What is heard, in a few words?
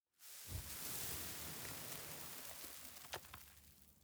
sand gust; Counter Strike Model Pack; CS:GO; dust2